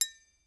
percussion; bespoke - Floral v.1

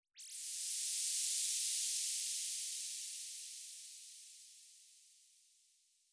laser sweeper; fx; bespoke - Floral v.1

